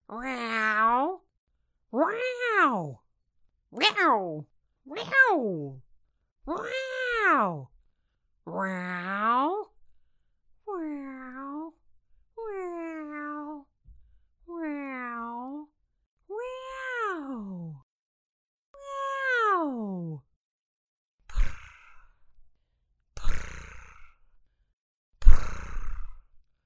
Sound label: CAT SOUNDS